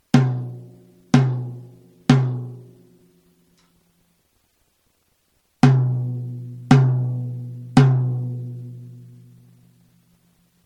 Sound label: rims